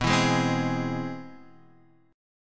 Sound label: C Augmented Major 7th B